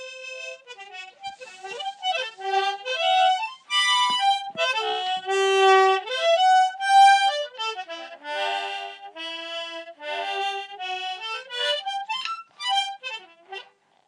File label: harmonica